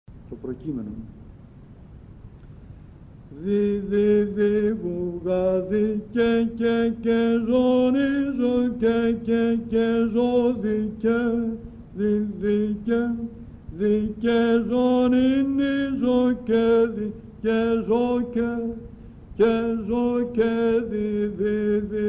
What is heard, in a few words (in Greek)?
ΠΡΟΚΕΙΜΕΝΟΝ, Psaltichie; ΑΝΑΣΤΑΣΙΜΑΤΑΡΙΟ; ΗΧΟΙ